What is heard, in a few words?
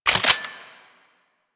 Heavy Shotgun Fire Mode Flakshot; Weapons; Warframe; Tenno